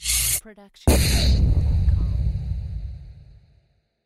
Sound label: cinematic rise hit spacefight; soundscrate